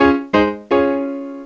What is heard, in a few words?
piano pitch